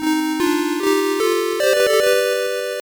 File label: Round Clear; Ghouls 'n Ghosts; master system